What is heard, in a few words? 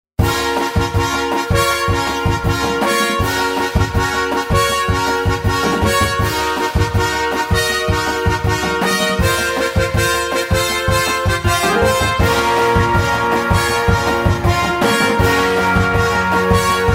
Athletic Support Gearing Up